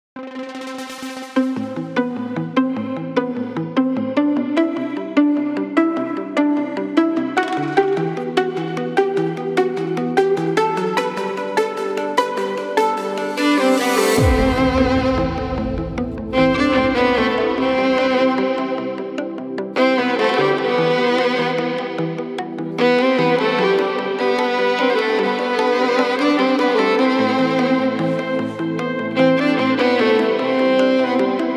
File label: electric violin cover